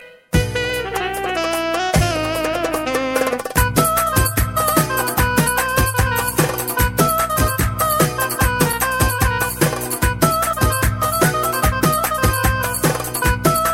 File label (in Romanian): Instrumentala